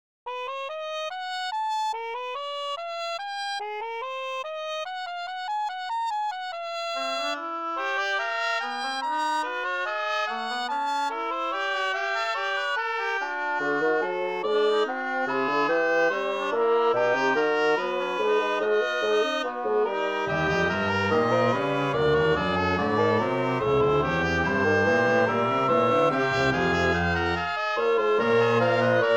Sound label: Fugue Cornett Oboe Bassoon Cello; Golden Mavila